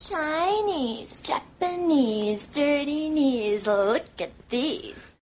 japanese chinese ringtones

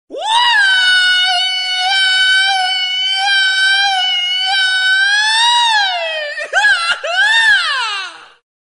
mexican yodel ringtone

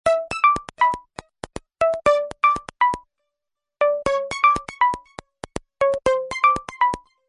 htc sms tune ringtone

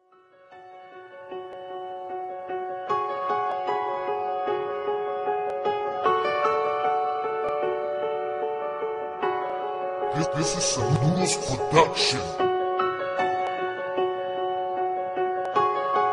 hard sad pain hip hop ringtone

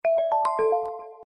alert ringtone

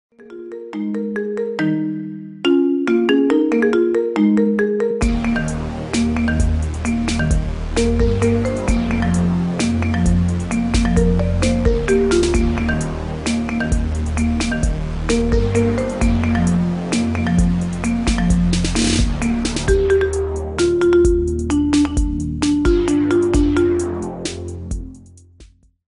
marimba ringtone

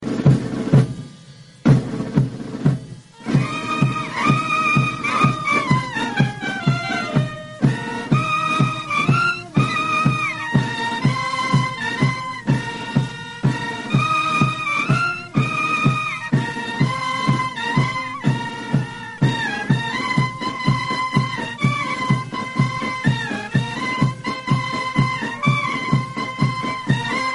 army band pakistani ringtone

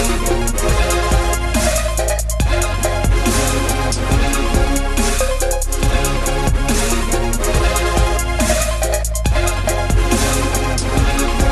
dubstep ringtone; iphone 8

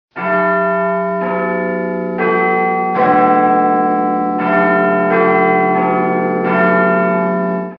new bell ringtone

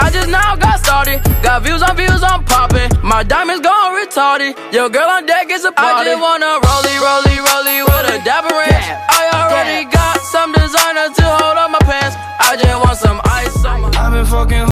new hip hop ringtone

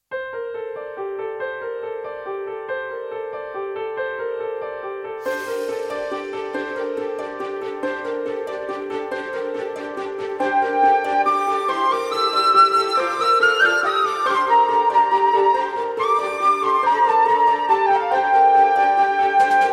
alarm ringtone

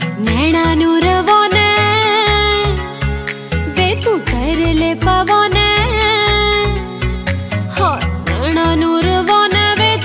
Punjabi female ringtone

